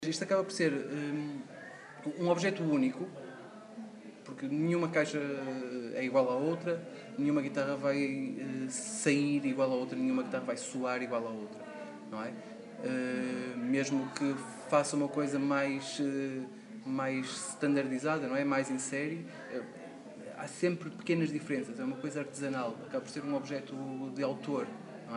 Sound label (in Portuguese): guitarras